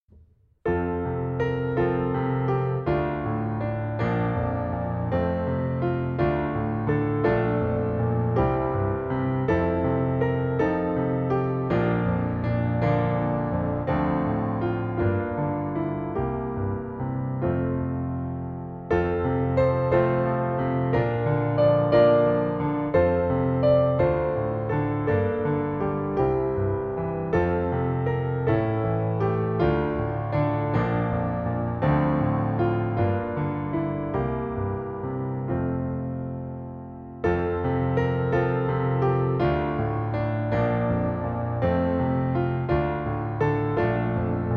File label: near the cross piano solo